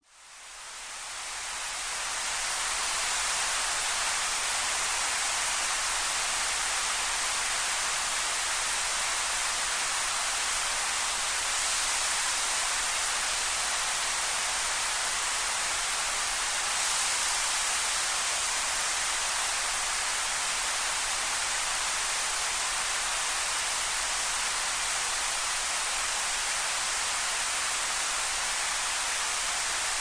Steamworks

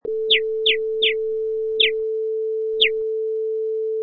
Bird Chirps

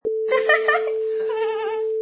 Lady Giggle

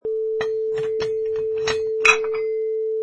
Iron Weight Sounds

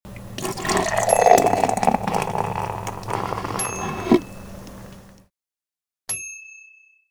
pouring a beer or soda

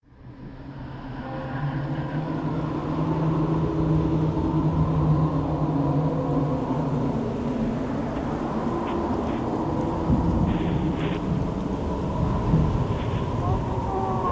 Haunted Graveyard

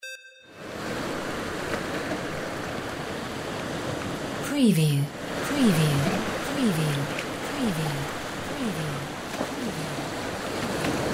SEA ROCKS; windy beach audio

